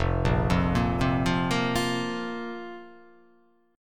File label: F Suspended 4th F